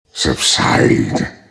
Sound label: death; sandking